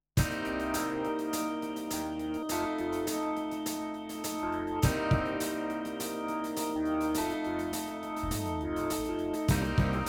funk mix

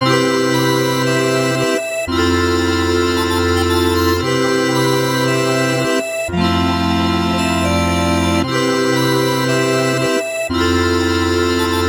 keys; porgiano

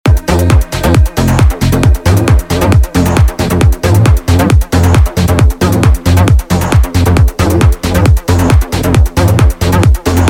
bass; luscious bass soundset

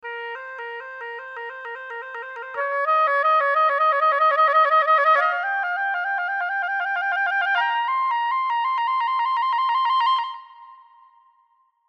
oboe tremolo; ribb

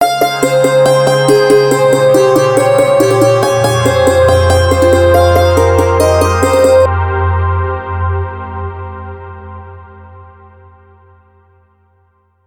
santoor; rdgaudio

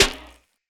wrapping paper snare

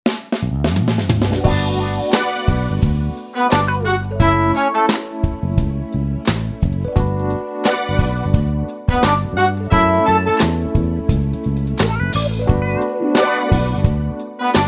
romantic mood

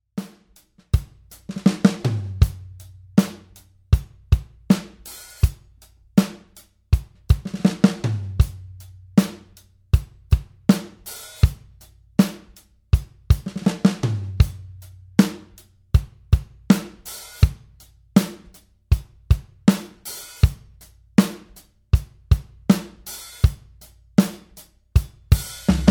80s pop

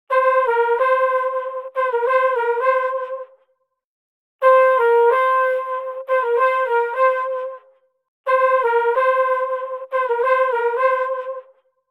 H910 dual harmonizer

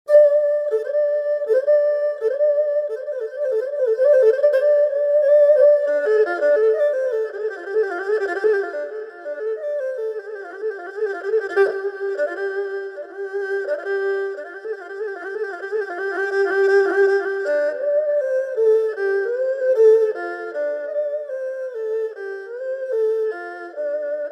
erhu horse races

